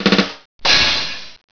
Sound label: drum rol